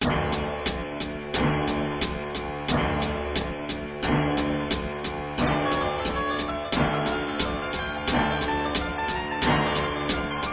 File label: beat